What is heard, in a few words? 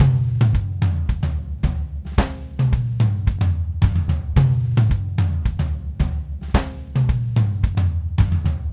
Red Maple Groove 110, DW Red Maple Kit